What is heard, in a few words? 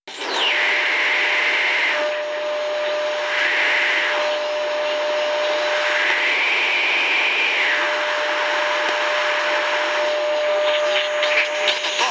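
Radio long wave Hiss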